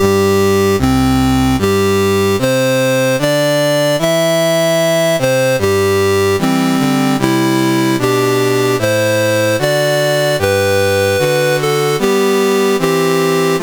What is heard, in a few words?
CSG8580R5, National Anthems; Saint Vincent and the Grenadines